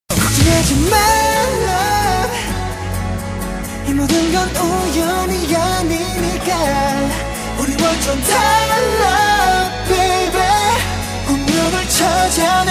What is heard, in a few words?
korean ringtone